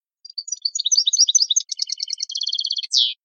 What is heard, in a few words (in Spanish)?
c terminacion; campero